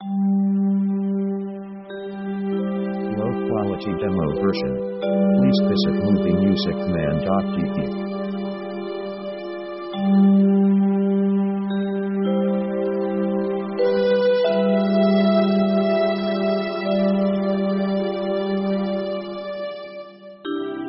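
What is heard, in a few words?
orchestral romantic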